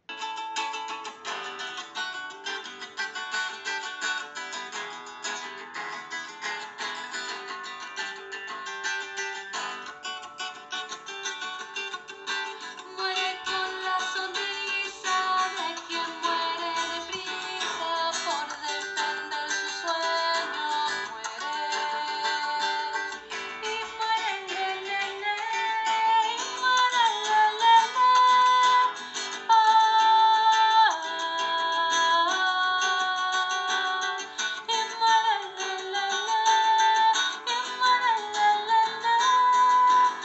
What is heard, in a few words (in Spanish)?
variación para soprano, mezzo soprano o barítono